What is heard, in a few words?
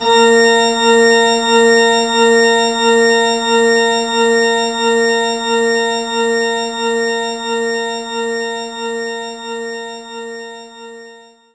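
Pipe Organ G#2; knights try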